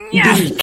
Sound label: voice male attack